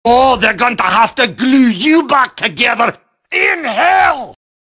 Yelling; Demoman

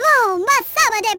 SMS

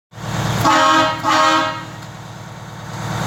Kenworth truck horn

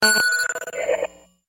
Sent

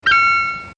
Text Message

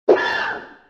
Slash; RE1 - Hunter

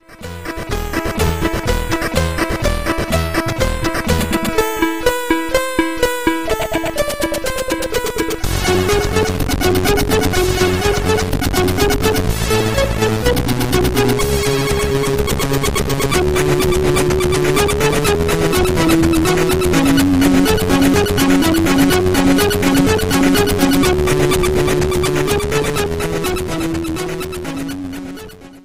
Retro Music

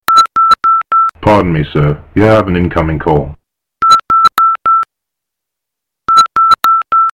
Polite Incoming Call

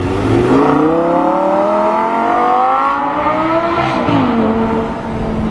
Gallardo Sound

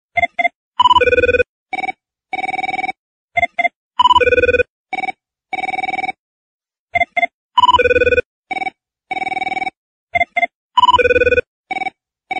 OFFICE PHONE

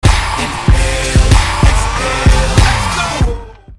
Inhale Exhale

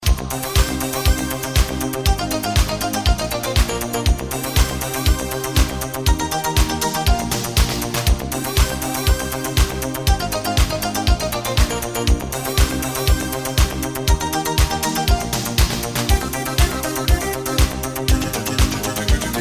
Koto

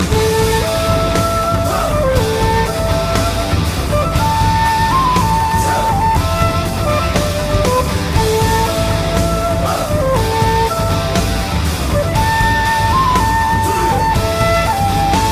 Flut; Naruto Battle

